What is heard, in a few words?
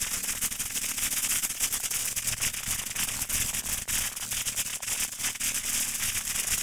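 shock ready big loop, magic, lightning; skyrim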